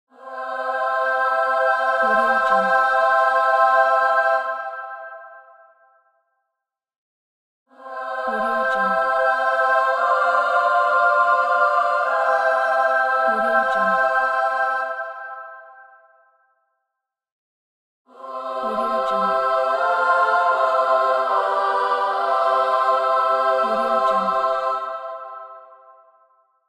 heaven choir

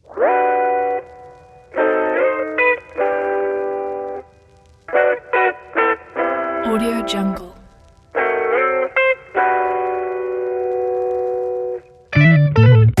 smooth soul flow

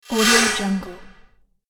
spooky spell whoosh

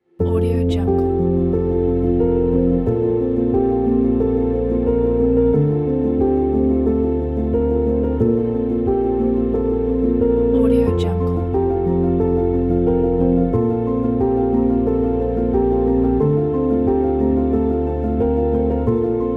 soft piano backround

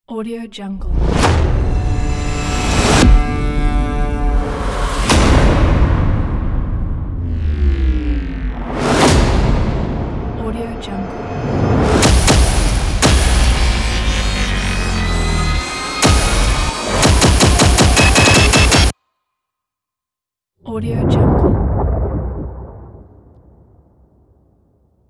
epic trailer sound design ident; etherealmusicproduction

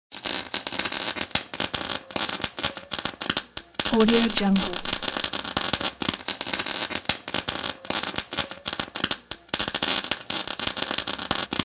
electrical welding

